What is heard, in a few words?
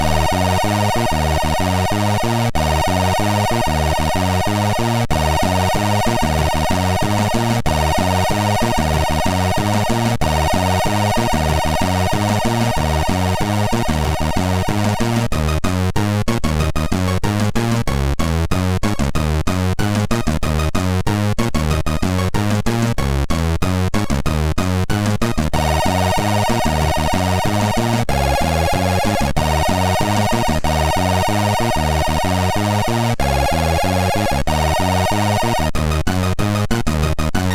Disco MOS6581R2